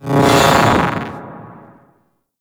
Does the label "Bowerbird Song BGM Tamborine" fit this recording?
No